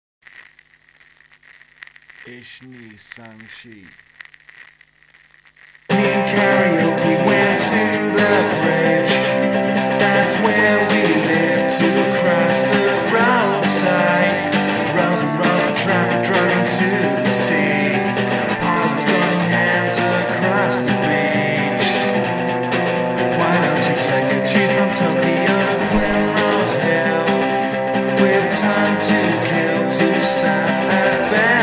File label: Karaoke